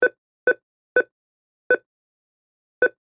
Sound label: ATM Beeps, Industry, Atm, Beeps, Enter, Pin, Number; Machinery; BlastWave